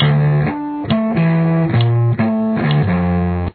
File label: opening slow